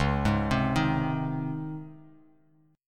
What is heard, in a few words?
C sharp Major 7th Suspended 2nd C sharp, Bass Standard; arpeggiated; chord; 9,11,10,8